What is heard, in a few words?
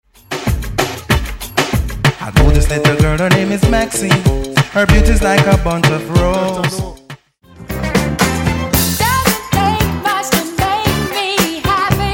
70s 80s 90s Funk Dj Intro Outro Tracks